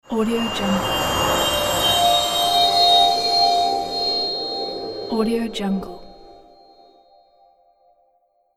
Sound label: spell of magic transition